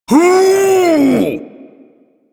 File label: Ability Lift Laugh; Kuva Lich